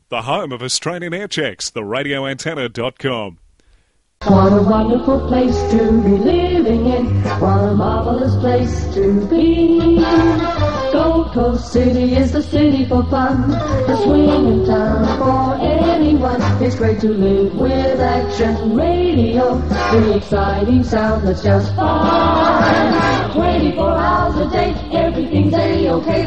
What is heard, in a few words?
Jingle; Aircheck; 1960s; Australian Radio airchecks